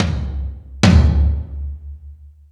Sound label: Stadium Rox, Drum Machines, E-MU Pro-Cussion